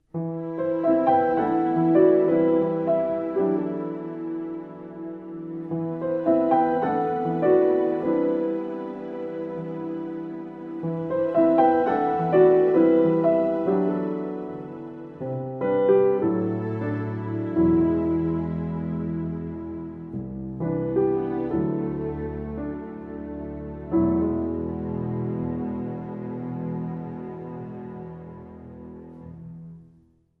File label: With Strings; 30sec